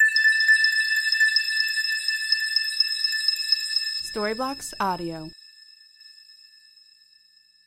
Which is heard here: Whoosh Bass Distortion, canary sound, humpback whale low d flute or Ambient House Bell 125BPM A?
Ambient House Bell 125BPM A